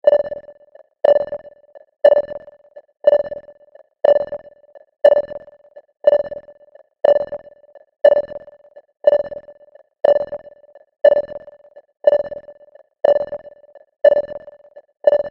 Alarm Loop; electronic

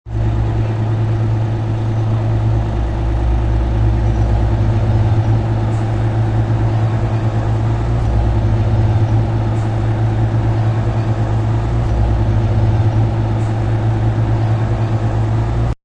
Bus Idle, A bus is idling; Busses; Vehicles

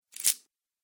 Coins Impact; Money Sound Effects

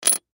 Key Set Down; Set Downs (Sound Effects:Objects); Blastwave; Foley

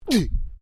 MALE VOCALIZATION UGH; Exclamations; Voice

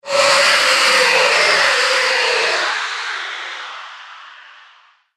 Screaming Ghost Roar; horror

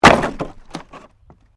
Wood Movement Heavy

